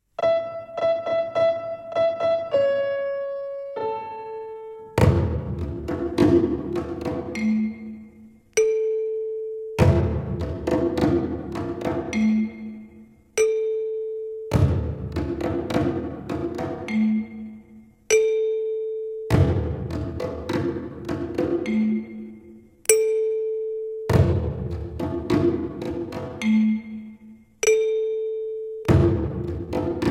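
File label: The Third Twinkle Rhythm, Violin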